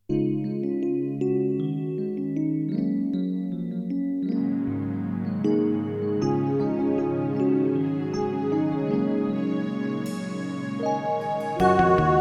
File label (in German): Sweat Beat, One-Touch-Preset mit VSP-Style; VSP-2; 450 CT; VIVA MUSICA Fachhandel